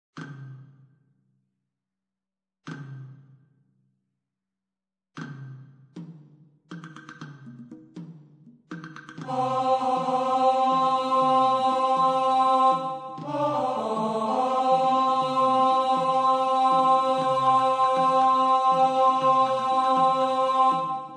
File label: tenor